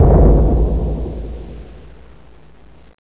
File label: vend